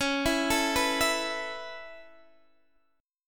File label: Db Minor 7th Sharp 5th, Guitar, Open D